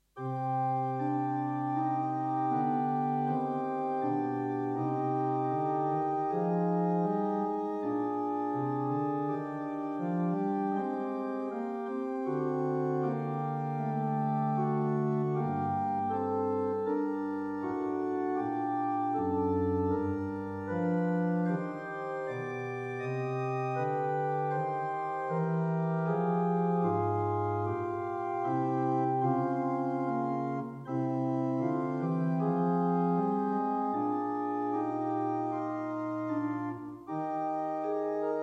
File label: Voluntary New Organ; Voluntary 8; Greene